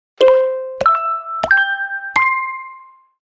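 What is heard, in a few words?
Romance tone; Lenovo; Yoga Tablet 10 B8000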